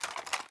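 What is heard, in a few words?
Inspect Movement; Valorant; cstrike; phantom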